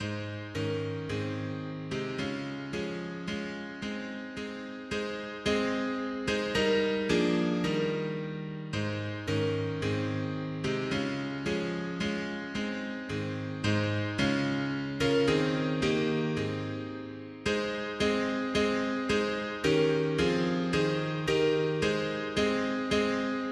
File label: mono piano; hymns; Himnario grande